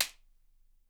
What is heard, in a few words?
WHIP; Miroslav Vitous - Percussion & Harp